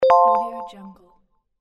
buy item

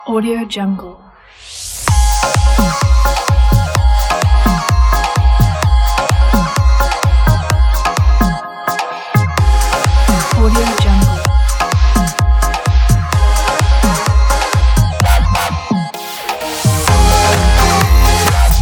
dance hall

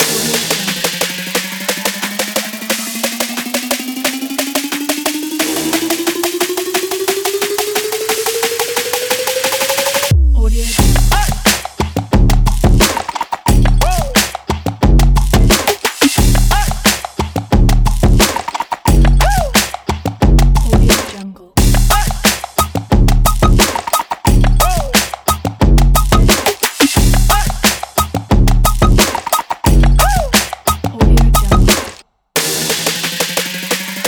rap beat

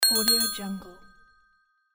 ringing bell reminder

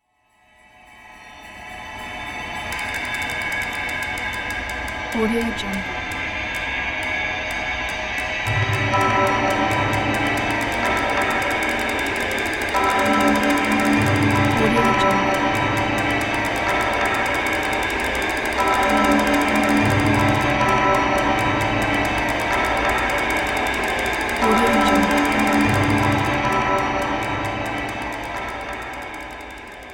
percussive horror background

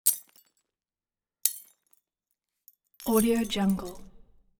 light bulb glass shatter; collanderson

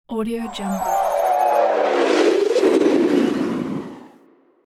futuristic movement

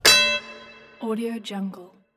boxing ring fight bell

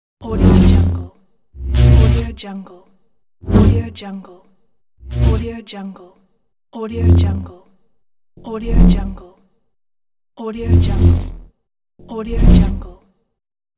lightsaber

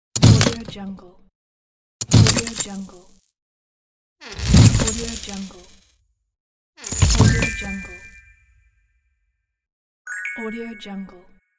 chest open with treasure

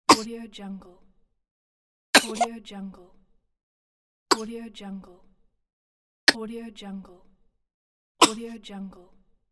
baby cough

